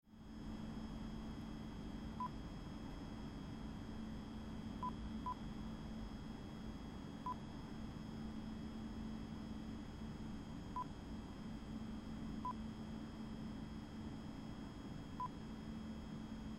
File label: Train Station Stationary Train